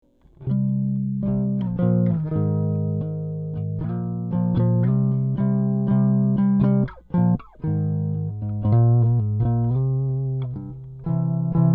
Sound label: Double Stops; no EQ, compression, or effects; Epi Rivoli